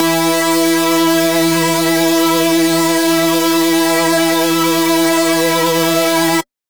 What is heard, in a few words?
Lead Shot E, Dubstep; One Shots; Witch; Ghosthack; Dubstep Sound Arsenal